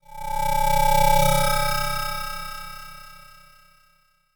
fm spooky metal shrill; sound design; zapsplat

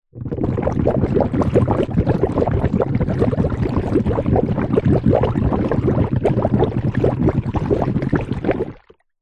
nature water bubbles foamy

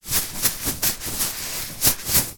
wheat heat bag movement in hand, household

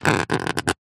foley small spike scrape scratch thin plastic; zapsplat

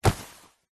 clothing jeans denim pants trousers catch; zapsplat

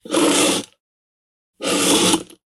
household chair wooden scraping on floor; sound effects one

